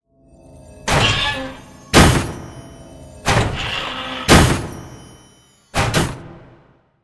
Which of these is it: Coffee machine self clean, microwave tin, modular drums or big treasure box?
big treasure box